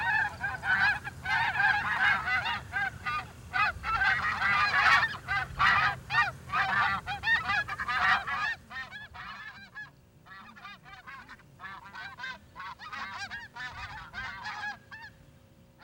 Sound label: geese fly honk